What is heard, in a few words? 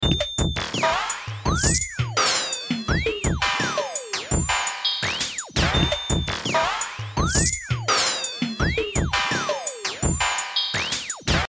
Stereo Kit mit One Shots